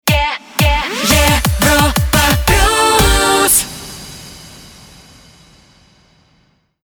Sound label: Jingle Rapid Fire; Europa Plus